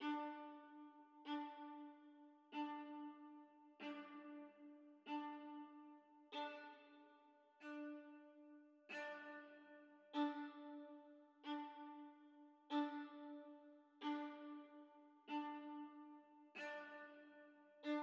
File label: Viola Riff 94bpm Loop With End Tail